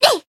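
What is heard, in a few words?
attack, Japanese; suzette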